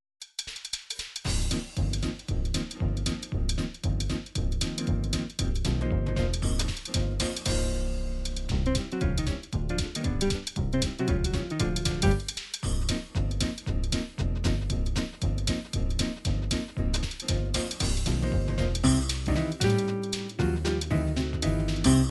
gyp swing, midi

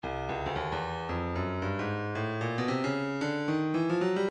breakbeat 113 bpm; Moth C; Stylus Breakbeat Midi Files

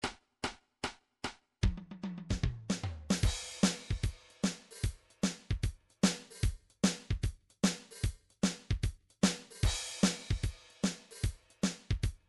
country; Drumtrax Library

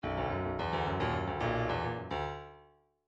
midi grooves funk

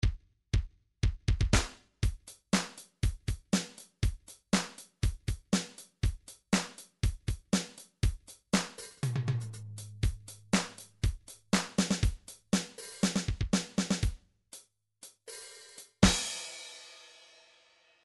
midi drums disco

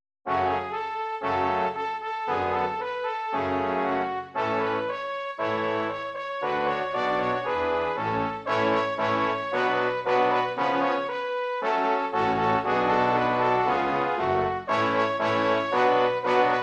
anthems slovakia

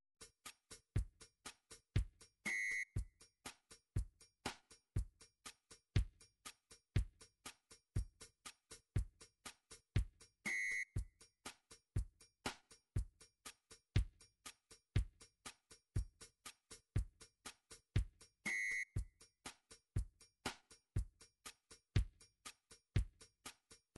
drums, midi drums

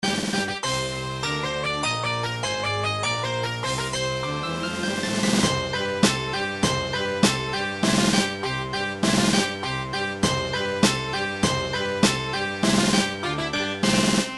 victory fanfare; nintendo nadia japan

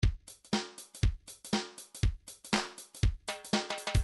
reggae 1 bar

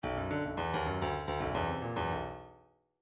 bdf midi file grooves funk q funk q

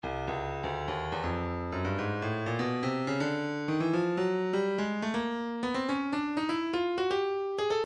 percussion midi files cowbells 123 agogo; Stylus

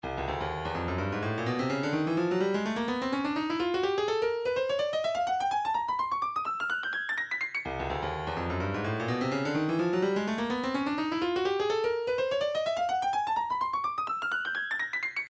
hip pop

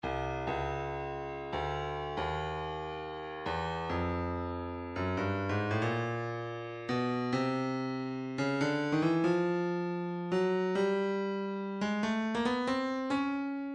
breakbeat 070 bpm urban tight; Stylus Breakbeat Midi Files

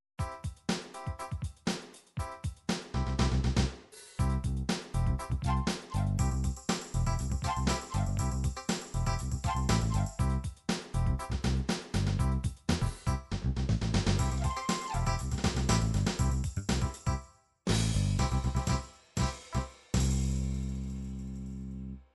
dance rap, midi

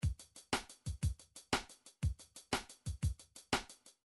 midi drums drums arpeg

